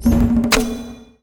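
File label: voodoo doll; vodoo munieco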